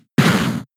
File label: Hit Normal Damage; Pokemon; gen 6 attack moves; XY ORAS